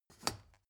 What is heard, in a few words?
step ladder wood scrape hard single; schritte